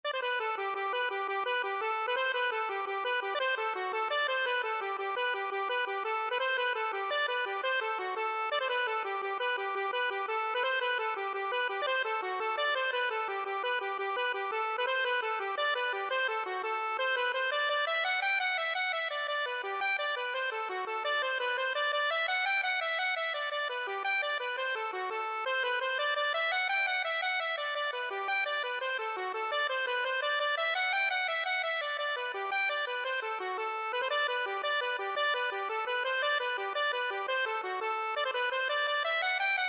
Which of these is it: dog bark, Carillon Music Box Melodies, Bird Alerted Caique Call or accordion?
accordion